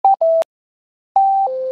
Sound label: radio notification